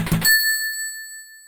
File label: cash register cha ching; sonic